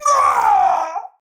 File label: Death, Battle; Warframe; Tau; Dax; Knight